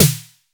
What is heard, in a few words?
S D DEEP; Crypton - DrumBase5000